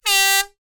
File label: sport air horn; sound effects one